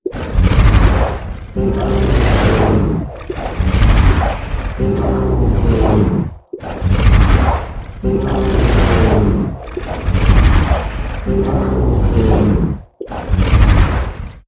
Not Human Creature Growls

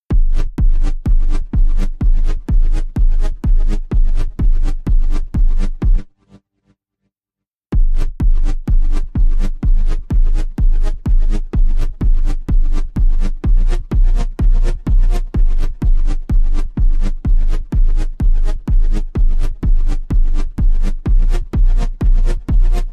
Drum and Bass

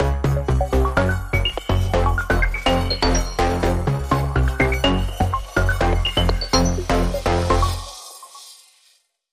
Link Sting

